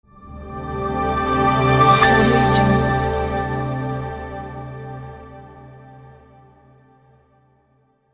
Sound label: brand logo